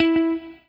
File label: ROCK, Guitar